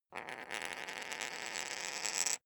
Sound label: poker chip spin short